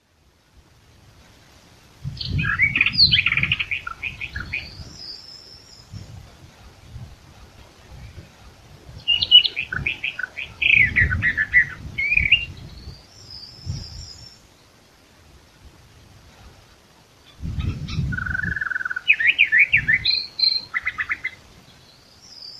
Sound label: sylvia crassirostris song; passeriformes; sylviidae; birds of the ussr